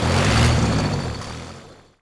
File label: nod mantis stop